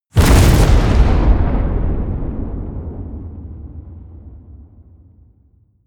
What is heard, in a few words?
explosion